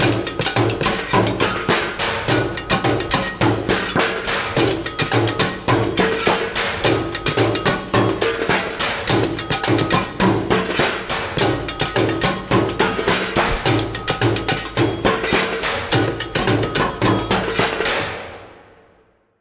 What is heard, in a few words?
Vent Kids Hideout Music; Skeg